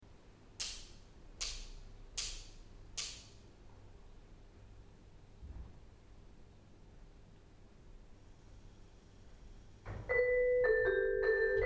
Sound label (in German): Vibraphon